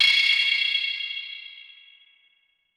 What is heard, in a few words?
gleaming hit, weapon